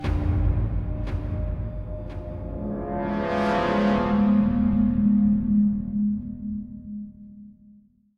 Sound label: Music Shorts